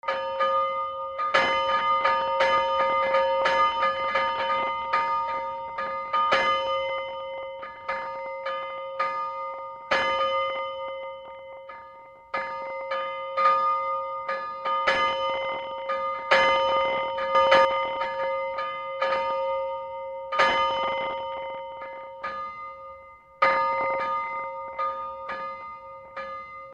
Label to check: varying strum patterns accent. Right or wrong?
Wrong